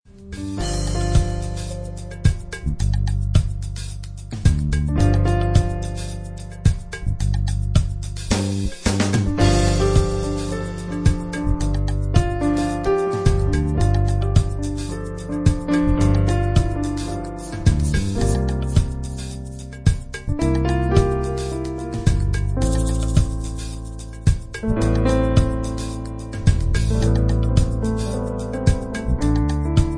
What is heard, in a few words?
romantic